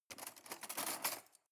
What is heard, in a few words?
cutlery cake forks drawer grab soft